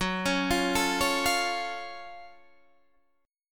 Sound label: Gb Minor Major 7th Gb down Guitar Open D